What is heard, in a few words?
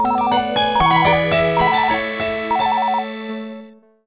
nokia java sound bank